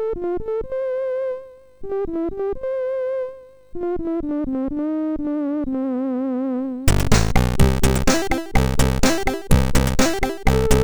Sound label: sid MOS6581R4; Goatse Brudbilder